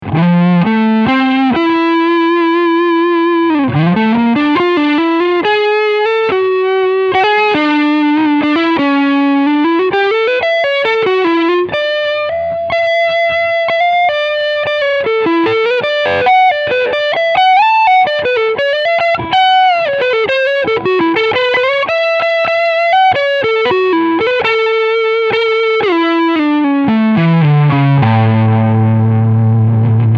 Full Gain

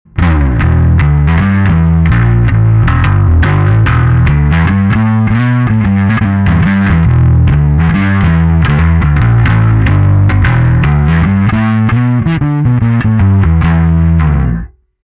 overdrive only

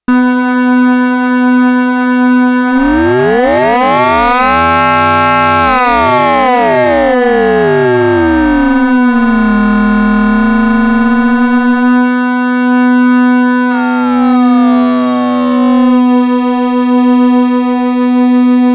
MPS Drone Chaos

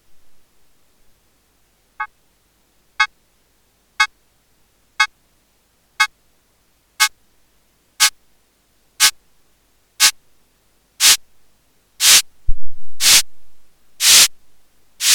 Noise Hat; Erica Synths Perkons HD-01

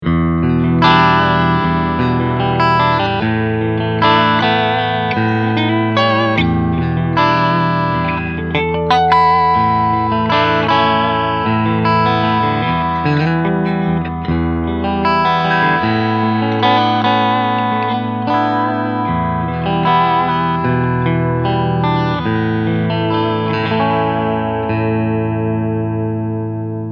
Clean Soft Flange

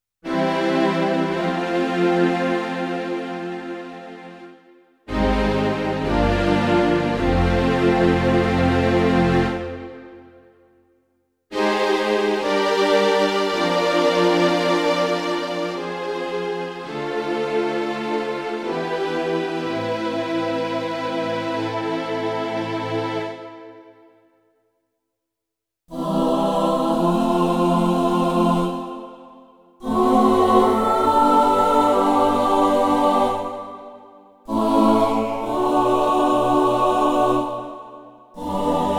CLP470 Strings Chor

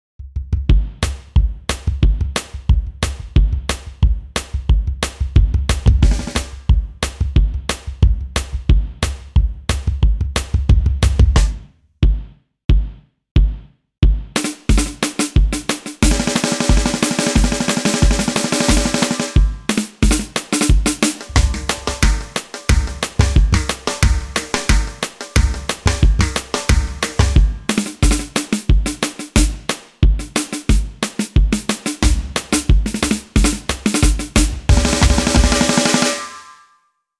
VA Ambience

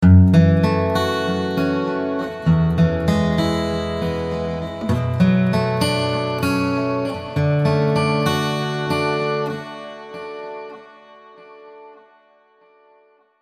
Guitar Tape Delay Spring

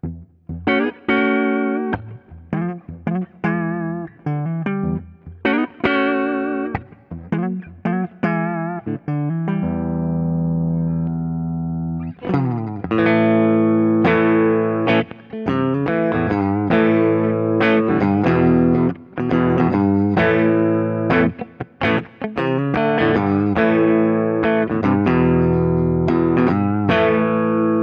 Ibanez AZR420 Humbucker Neck to Bridge Clean

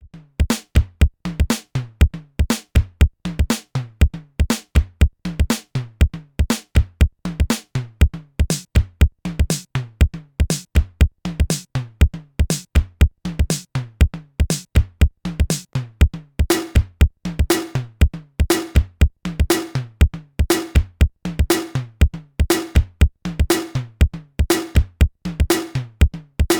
Simmons SDS 1000 Factory

